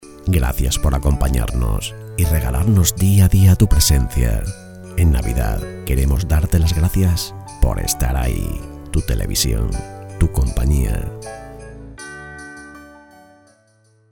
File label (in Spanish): jingle navidad television; Mensaje navideños para emisoras de radio . Número 5.